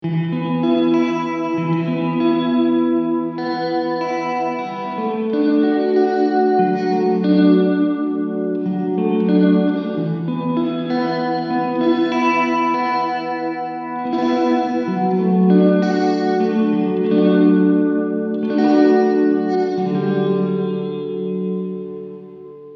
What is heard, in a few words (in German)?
Hall Maximum